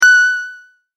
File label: multimedia tone correct